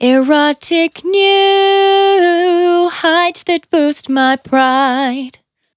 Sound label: Finally 70bpm C, Velvet Vocal; Zero-G